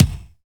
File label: KIK SOLID, Dance Kicks; Roland L-CD701; Dance K1